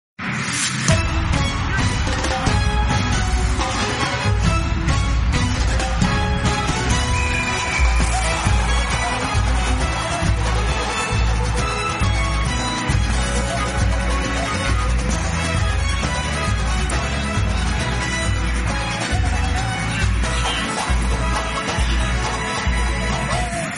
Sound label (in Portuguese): trilha de gol